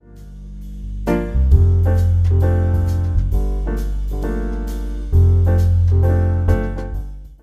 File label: tarbukas sample